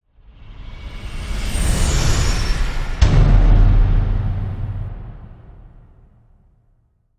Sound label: Whoosh To Hit; Whoosh, To, Hit, Metallic, Horror, Production Element, Imaging Element, Accent, Transition; BlastWave